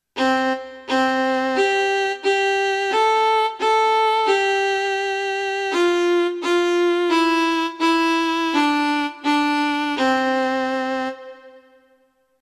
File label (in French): violon alto